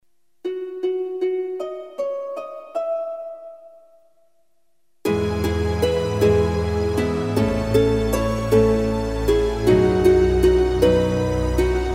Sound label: instrumental